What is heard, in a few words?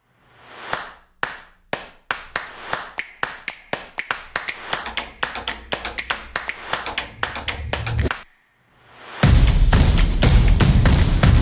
Drumming Action